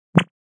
Blip; Beeps

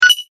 multimedia rollover, Multimedia Rollover Tone

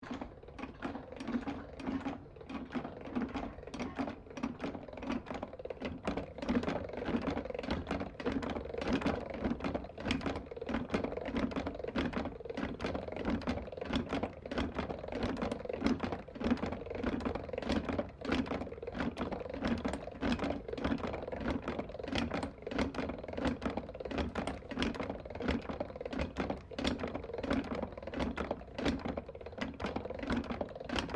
Butter Churn Domestic Counterweighted Operating; Kitchen; Household

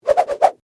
Whip Whoosh Multi, Throw; Foley; Whip Sound Effects; Whips (Sound Effects:Objects)

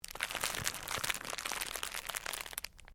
Cellophane crinkle

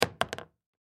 Pool Pocket Empty, Pool Pocket Empty Ball Drop; SPORTS; Blastwave

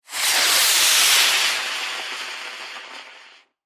Rocket Launch Small, Scifi, Missile; Science, Fiction; Spacecraft; BlastWave

